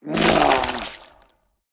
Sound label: Snail Death